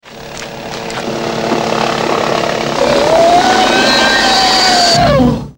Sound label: Hughes 500 plus Howl; Airwolf